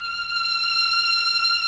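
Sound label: MELLO STR, Mellotron violins; Vintage; Sonic Reality; Vintage Timetraveler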